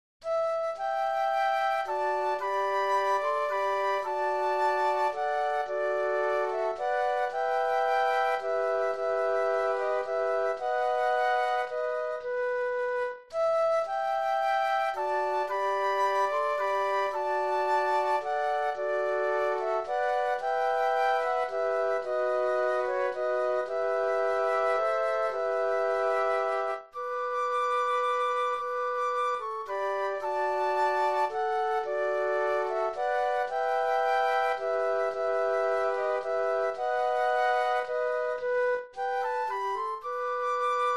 Greensleeves Flute Trio

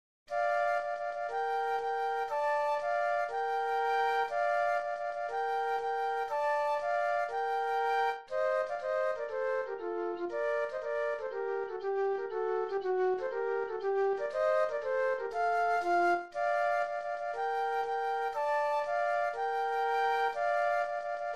march flute duet; classical; Tchaikovsky

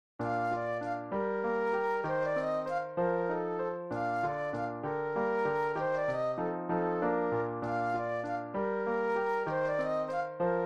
German Dance Flute Solo; classical; Beethoven; op. 130

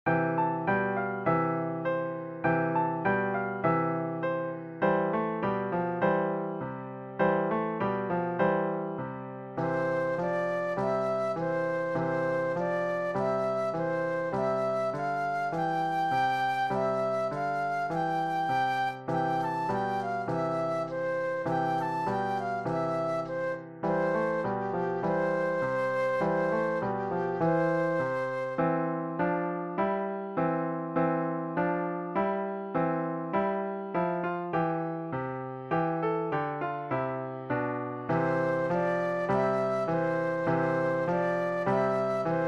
Flute Trio and Piano; Are You Sleeping

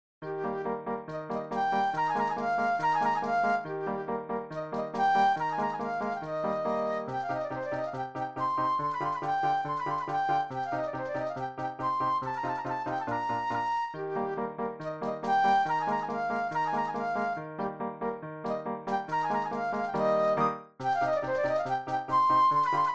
Rondo a Capriccio Flute Solo; classical; Beethoven

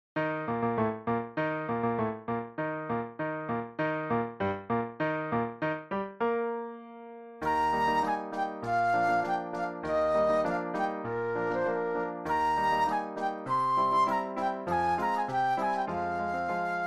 Marche Militaire Flute Solo; patriotic; patriotic solos

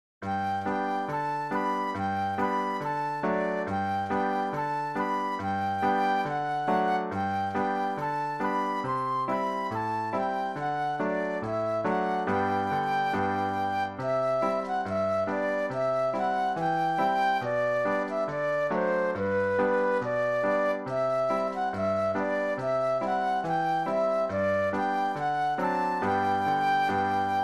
Yankee Doodle Flute Solo; patriotic; patriotic solos

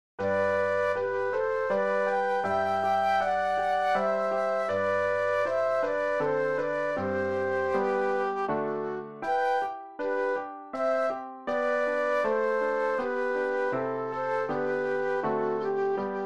Minuet Flute Duet and Piano; classical; Gluck; Wq. 30

